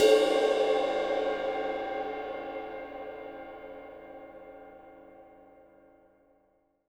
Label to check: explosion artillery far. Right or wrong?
Wrong